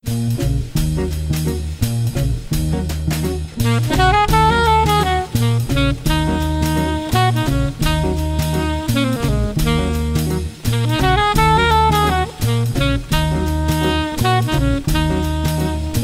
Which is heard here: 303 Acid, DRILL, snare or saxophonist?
saxophonist